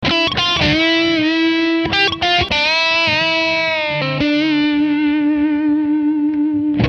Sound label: Am 110 solo